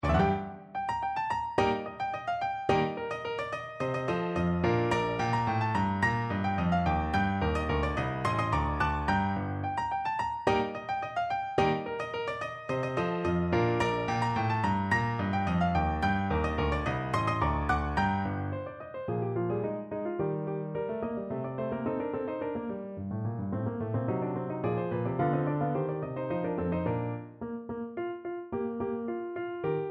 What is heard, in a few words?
Fossiles PNO, piano